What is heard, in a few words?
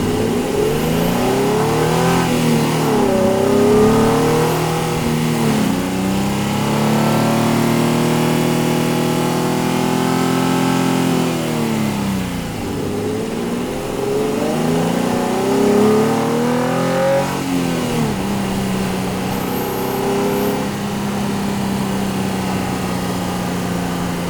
vehicle racingcar drive